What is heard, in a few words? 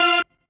normal hit normal